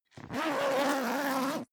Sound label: zipper down jacket open; objekte; reissverschluss